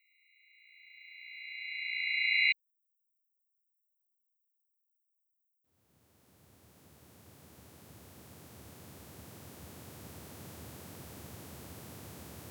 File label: mirror music LOOP GAPLESSLY